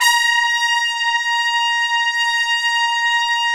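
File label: brass trumpets section; Roland LCDP06 Brass Sections; Tpts Sec.2-3; Tps Sec.3 f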